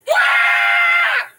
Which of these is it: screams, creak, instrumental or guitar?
screams